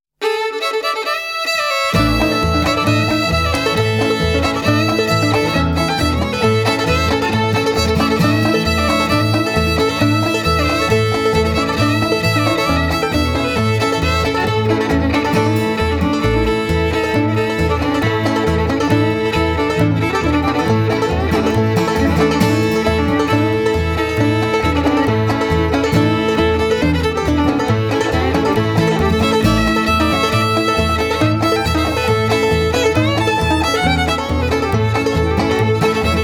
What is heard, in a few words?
banjo